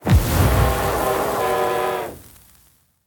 Ghost Death; Devour; The Town

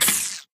Propeller hat Walkman Off

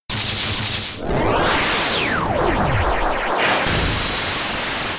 Fusion Bolt; Pokemon; Gen 5; Blk Wht Blk2 Wht2

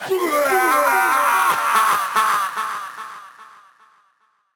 Dwarf Death

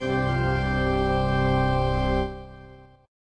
easy task complete; Morytania

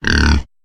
Boar Attack; gamerip; Idle Champions of the Forgotten Realms

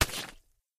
Cheese; doodle jump 2; android

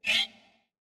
Ui Chat Open

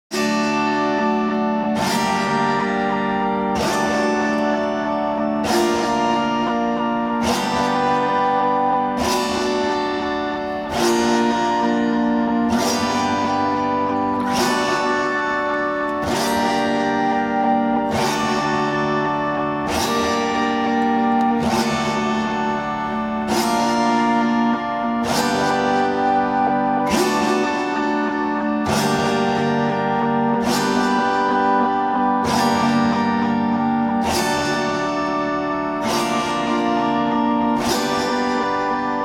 Acoustic; Quest for Honor

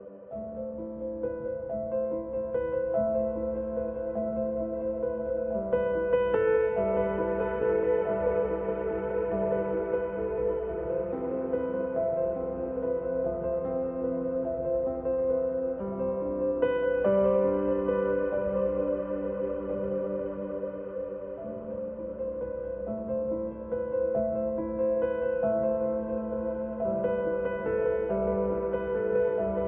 Secret Tower Piano Layer; RiME

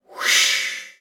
Whoosh

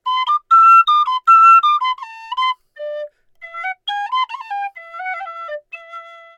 Flute Player Melody; The Witcher 3 Wild Hunt

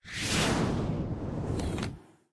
Rocket

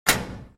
SFX lever on; through abandoned the underground city

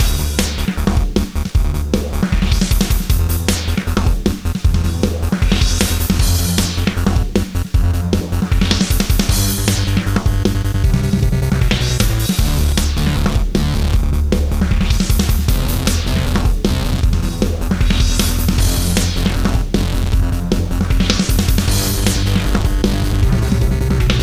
Gameplay Background Music; 10 Second Ninja X

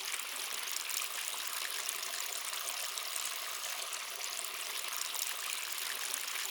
waterfall small loop; adams venture origins

